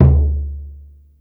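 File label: surdo open, Yamaha Motif ES drum machine; 300 Drum Machines; Yamaha Motif ES-Series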